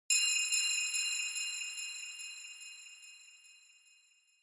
Bell Accent Delay

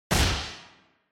Motion Slam Dry

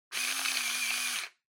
Spice Mill Salt

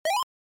NES Action Item

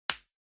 Hand Foley Clap Dry

